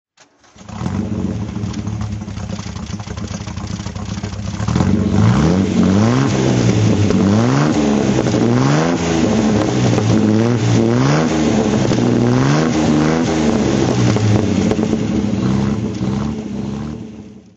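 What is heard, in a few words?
healey 3000 mkII throttle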